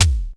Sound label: ELEC.DRUMS; Crypton - DrumBase5000